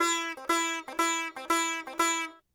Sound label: sitar